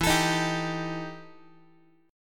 E Minor Major 7th Double Flat 5th E Guitar; strummed; JGuitar

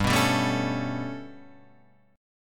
B 7th Suspended 2nd Sharp 5th, chord

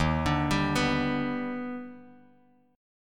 D sharp Augmented Major 7th, Bass Standard; arpeggiated; 11,17,17,16; JGuitar

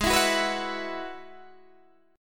Ab Minor 7th Sharp 5th Ab, down, Guitar, DADGAD

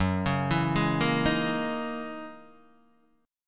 F sharp 13th F sharp down Guitar DADGAD

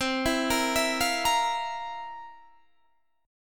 C 11th, C, down, Guitar, DADGAD

